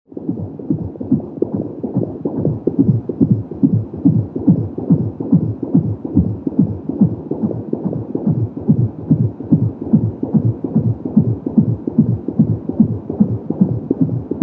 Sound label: Baby Heart Doppler